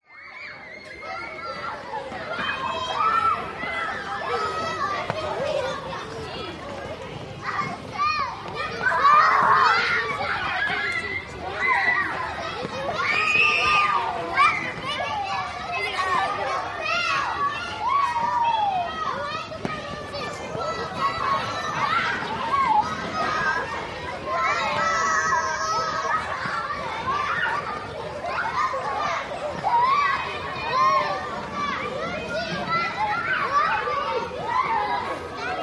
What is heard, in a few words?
Children at Play, Many, Ambience